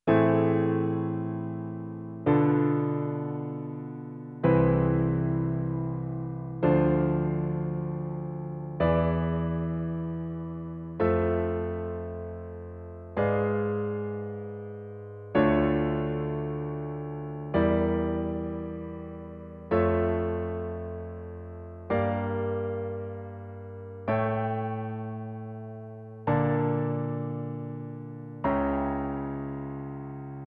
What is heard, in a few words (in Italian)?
fisarmonica accordion, pillole di jazz accordi a quattro voci